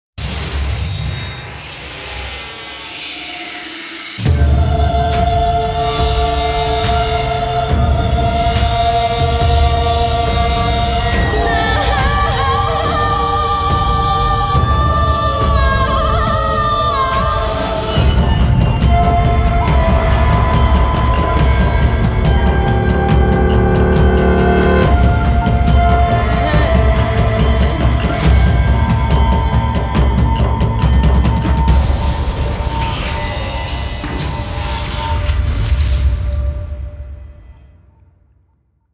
Epic Trailer

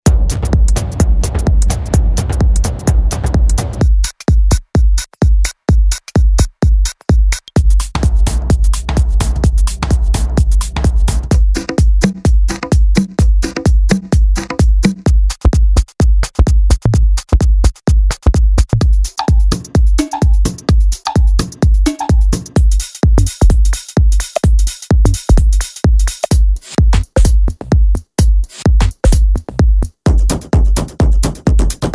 Perc & Hats Loops

